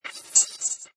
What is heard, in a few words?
Digital Static Fuzz Warble Sweeper; Scifi Production Elements, Set 2; Lynne Music